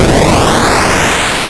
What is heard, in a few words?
magic